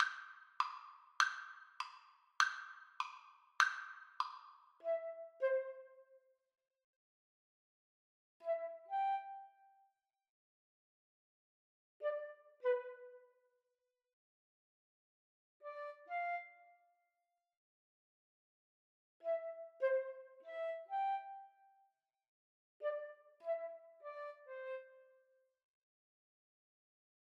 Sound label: Flute duet